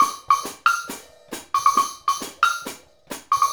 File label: Wood Block and Hi Hat